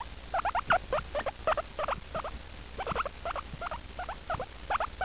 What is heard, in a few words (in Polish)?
cmokanie, Swinka Morska